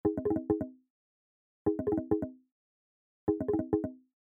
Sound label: out call, phone